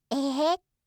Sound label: ehehe; serifu others